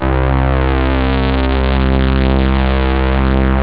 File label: String; SynthSamples2